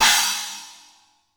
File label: XE8 CHINA; Crypton - DrumBase5000